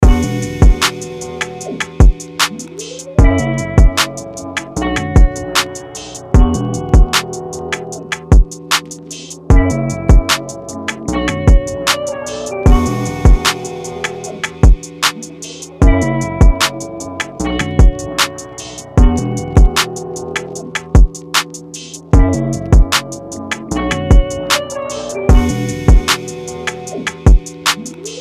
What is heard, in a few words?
152 BPM Bm